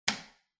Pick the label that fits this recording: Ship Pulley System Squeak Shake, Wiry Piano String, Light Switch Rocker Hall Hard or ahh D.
Light Switch Rocker Hall Hard